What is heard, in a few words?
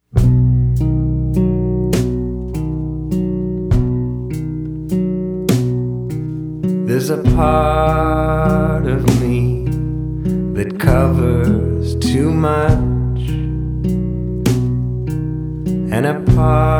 Talking Blues